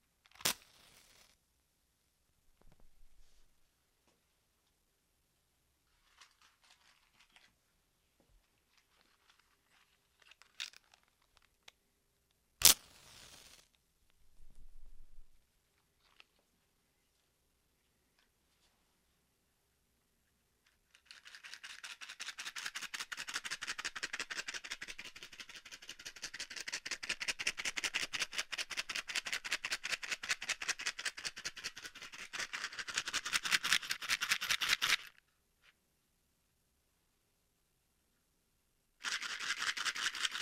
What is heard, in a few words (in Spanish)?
Cerillas Mono